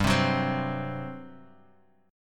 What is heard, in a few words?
G Major 7th Suspended 4th G, Guitar Open G; 5,5,4,5,x,x; down